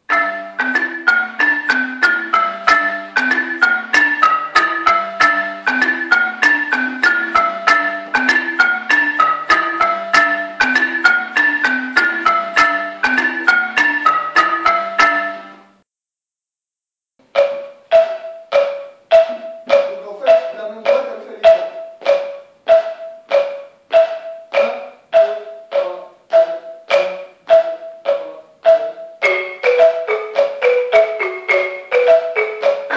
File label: Balafon